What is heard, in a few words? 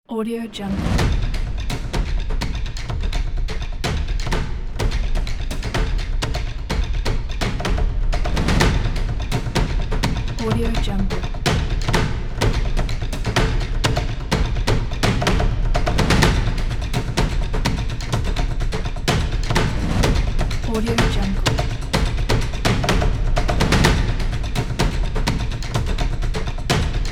percussion tribe